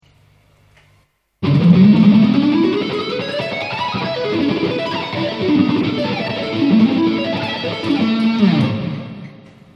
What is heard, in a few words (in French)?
Pick & Sweep en D # Phrygien, Exercices de Guitare; Guitar Web